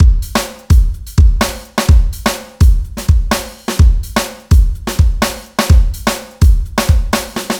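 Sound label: funky 126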